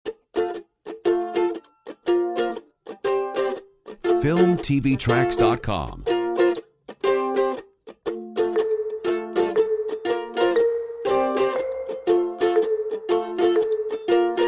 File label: Happy go quirky